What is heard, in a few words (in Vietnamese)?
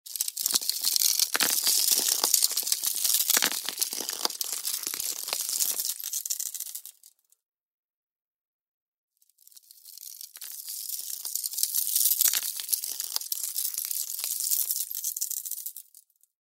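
Âm thanh đóng băng tức thì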